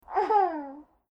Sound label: baby laughing